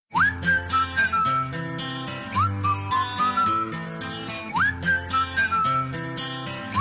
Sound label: whistle, Message Tones; apple whistle